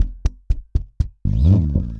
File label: Bass Slides and Noise; Guitar and Bass Effects; Ableton; Factory Packs